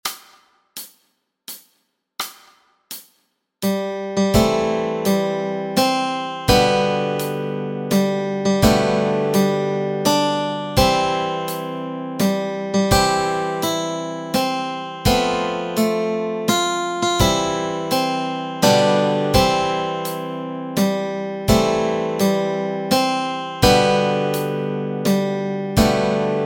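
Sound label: Happy Birthday Melody and Chords Track, Guitar and Ukulele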